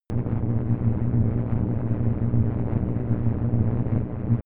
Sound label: space background noise rough; weltraum